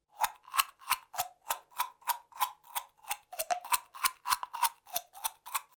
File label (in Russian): zvuk zubnoj shhetki